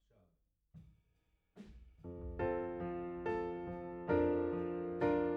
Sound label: Piano A